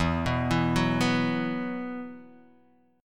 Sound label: E Suspended 2nd, Guitar Open D; 2,2,2,0,2,x